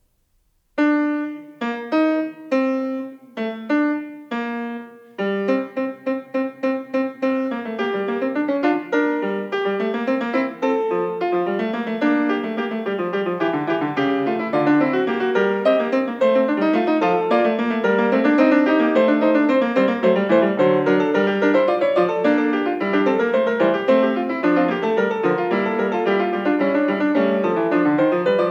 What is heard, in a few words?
Prelude Fugue in G minor Fuga; No. 16; BWV 885